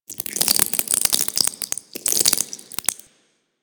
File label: Taunt Flesh; Warframe; Proto Infested Ancient; Abilities